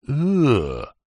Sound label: human male adult says uggh; zapsplat